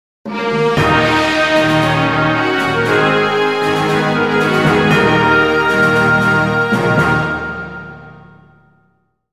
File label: Jingle Win; Desperados 2 Cooper's Revenge